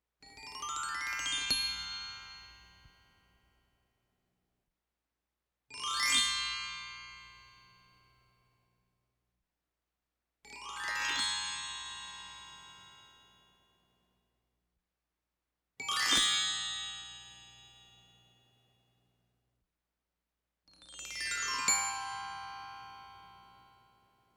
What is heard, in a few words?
Xylophone UP Gliss; Electa Quinney